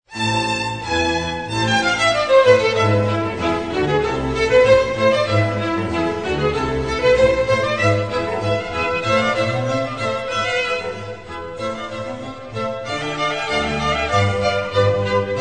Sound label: classical vivaldi concerto